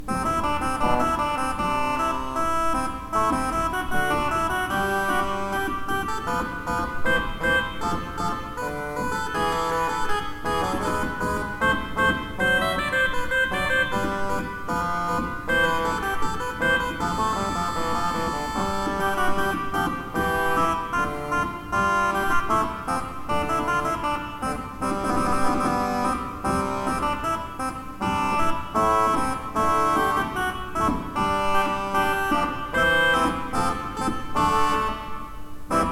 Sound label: pipe organs; Steinmann